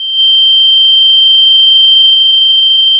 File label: Sound of a tea kettle whistling